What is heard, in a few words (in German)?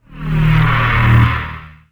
kollision